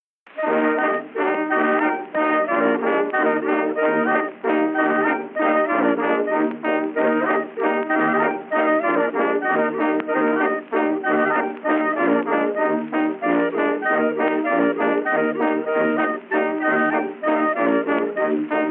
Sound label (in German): Stadkapelle Zwiefacher; Stadkapelle Finsterer